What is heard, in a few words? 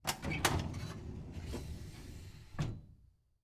Filing Cabinet Drawer Opening; household